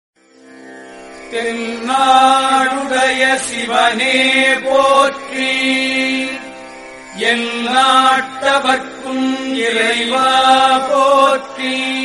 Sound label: devotional Tamil